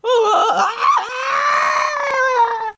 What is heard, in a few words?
fall